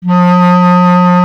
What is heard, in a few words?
B. Clarinet